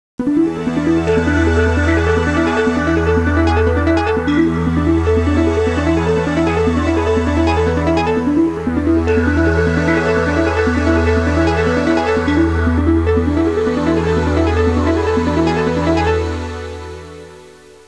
musica elettronica; electronic

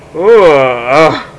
UHH, gemiti esclamazioni mugolii

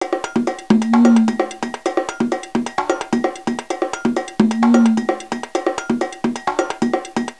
suonerie musica ritmica

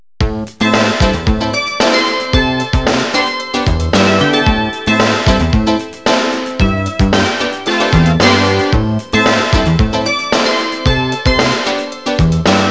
suonerie businessy

